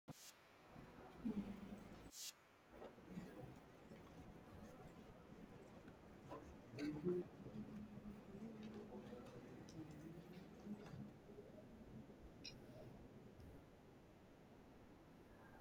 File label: my music